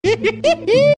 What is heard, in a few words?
hehehehee